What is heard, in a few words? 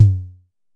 Drum X Tom, Pearl Drum-X